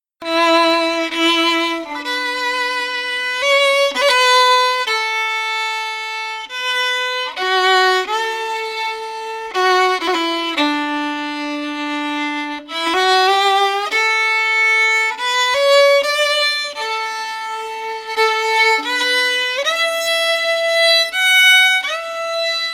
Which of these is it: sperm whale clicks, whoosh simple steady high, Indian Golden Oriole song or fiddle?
fiddle